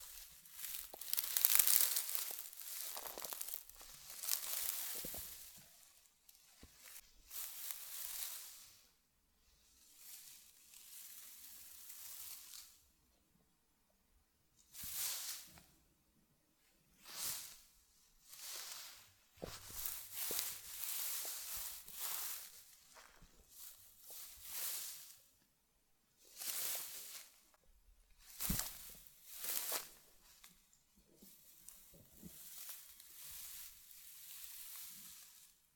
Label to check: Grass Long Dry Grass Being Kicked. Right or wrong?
Right